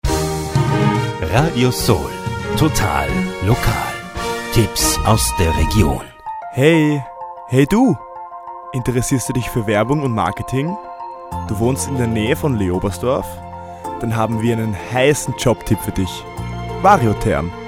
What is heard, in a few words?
Jingle; RS-TL Variotherm 2021